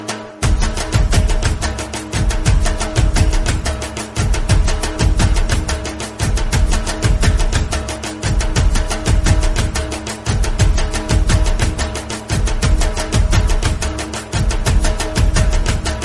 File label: Teenmar Drums Beat, ringtones